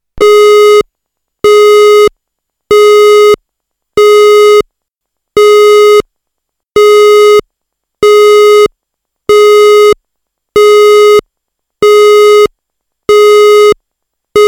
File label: Tone